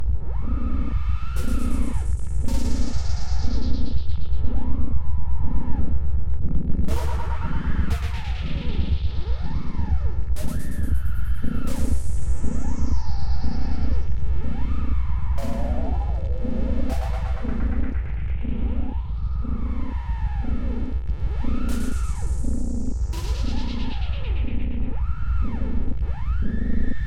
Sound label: Biosphere In A Spaceship